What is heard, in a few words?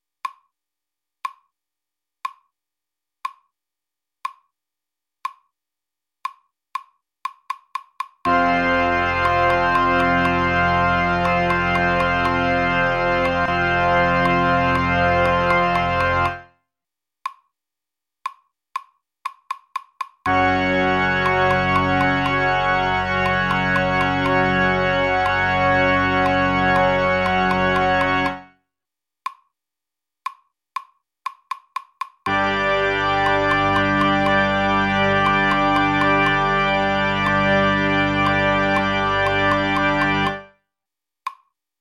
Clicks 6 notes F, tuba